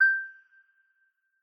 oneplus notification tone

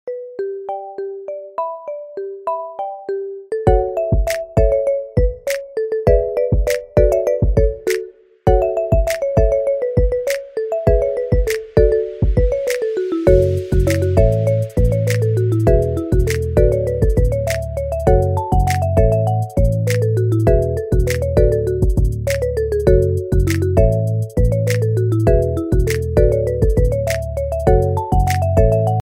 marimba remix ringtone

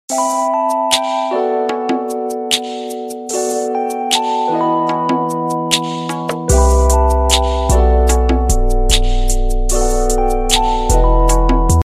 new blackberry tone ringtone

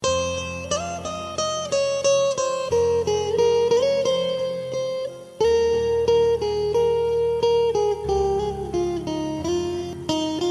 sad guitar ringtone; ashique2

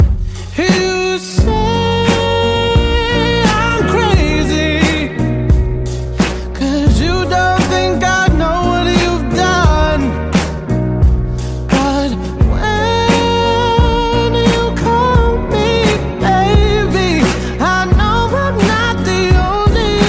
iphone ringtone

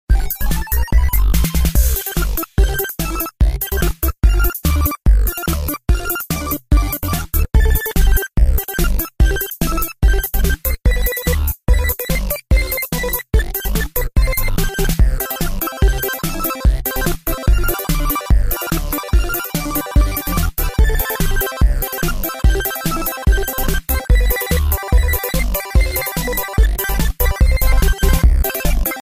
pixel song ringtone

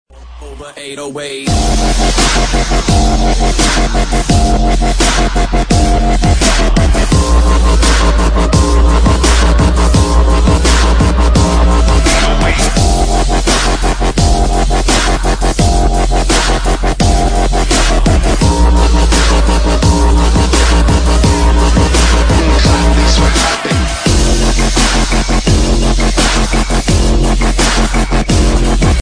808 track ringtone

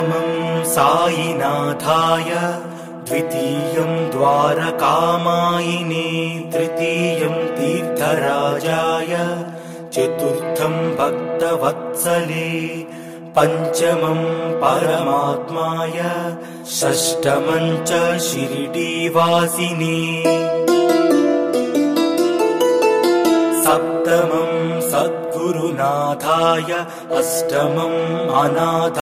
lord sai baba telugu ringtone

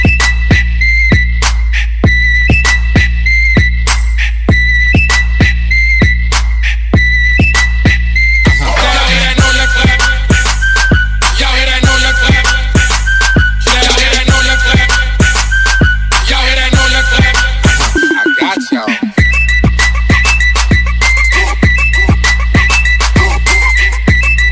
ringtone, english ringtones

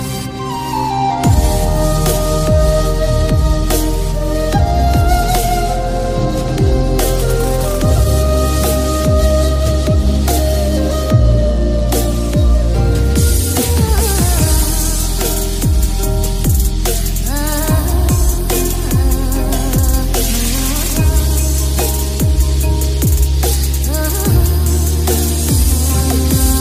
heart breaking turkish music ringtone

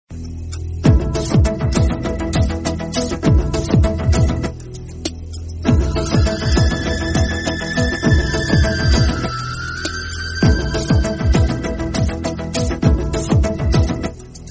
night time background music tone ringtone; galaxy ringtones

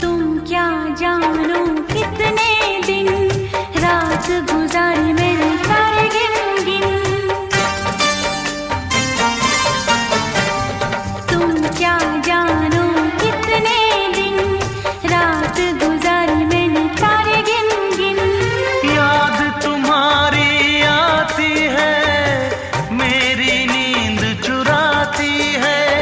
best romantic hindi ringtone, bollywood ringtones

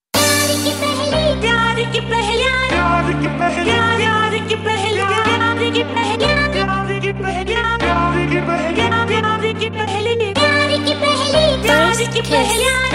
song ringtone bollywood ringtones

